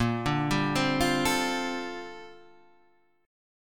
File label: G Minor 11th A sharp